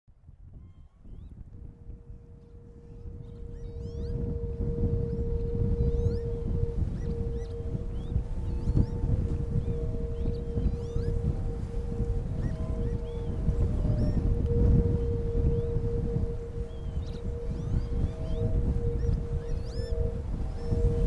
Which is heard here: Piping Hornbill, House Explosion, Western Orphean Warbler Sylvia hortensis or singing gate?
singing gate